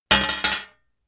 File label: metal plate thin drop